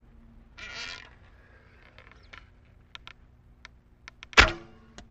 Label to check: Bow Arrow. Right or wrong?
Right